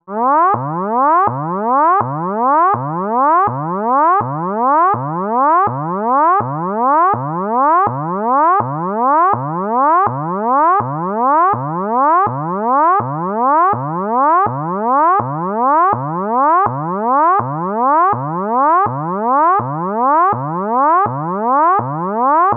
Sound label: Triangular Growling Wailing